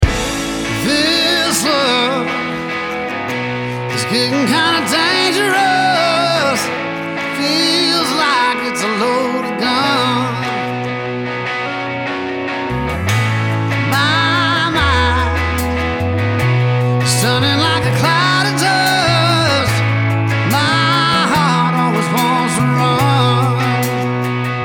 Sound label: Vox Dyn EQ Out